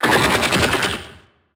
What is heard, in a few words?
vocal; hollow